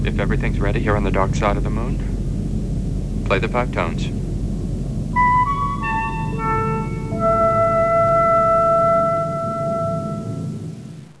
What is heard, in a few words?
5 TONES